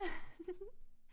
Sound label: giggle